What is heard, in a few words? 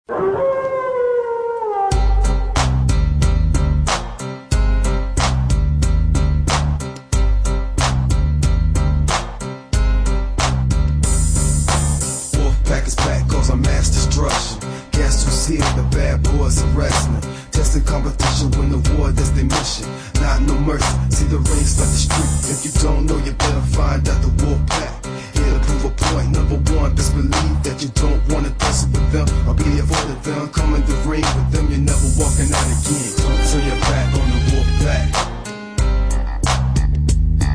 theme no crowd noise; nwo wolfpack